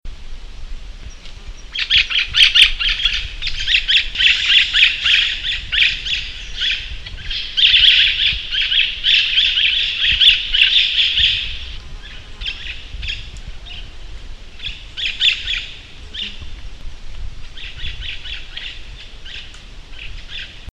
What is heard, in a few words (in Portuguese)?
canto da maitaca